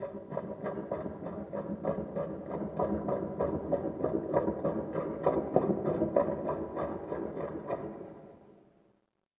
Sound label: marching distant; Half Life Model Pack; Half Life 2; ambient levels streetwar